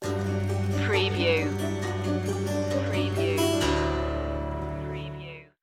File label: stinger